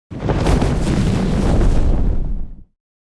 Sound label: Dragon wings flapping movement single